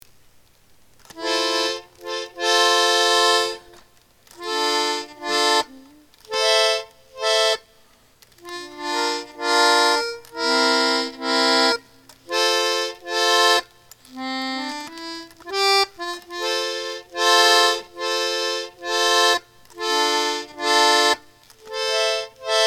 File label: accordion